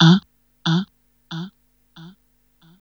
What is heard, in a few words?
VOX, Hip Hop R n B; USB Soundscan vol.19; AKAI; HHFX 89